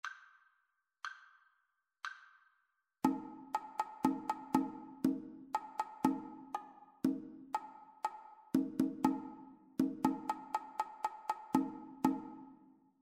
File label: two part rhythmic dictation; ear training